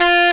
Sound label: SAX TON; E-Lab; X Static Goldmine 2; akai